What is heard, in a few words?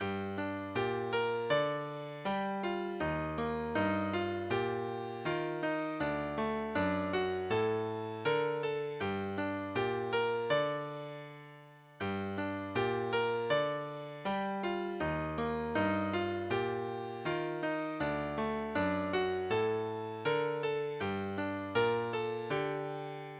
piano